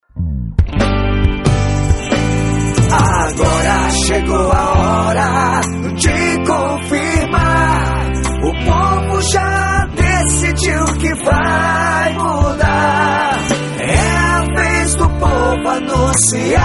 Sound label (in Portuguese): Jingle politico